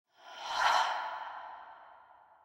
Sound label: mirror Breath; fairymirror